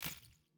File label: ui reward appear